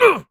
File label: Player Life Lost; AudioWizards